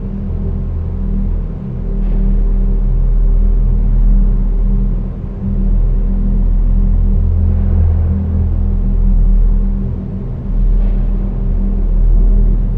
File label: tech corridor; Duke Nukem Forever